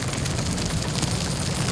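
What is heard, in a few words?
droideka roll start loop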